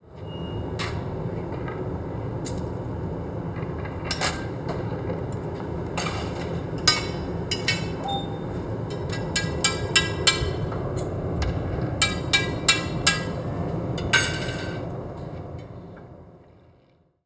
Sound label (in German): Glashütte Glasabschlag; Museum Glashütte